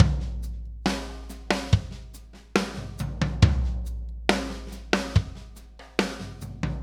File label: Loop, Drum Tools, Laid Back; Sonic Foundry (Sony Creative Software); Producer Dan